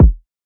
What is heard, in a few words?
Triple Kick E; Drums; One Shots; Kicks; Humble Triple Kick; Cymatics - Humble Hip Hop Sample Pack